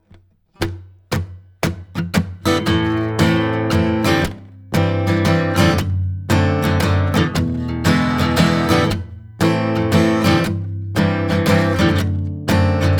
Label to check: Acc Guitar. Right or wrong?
Right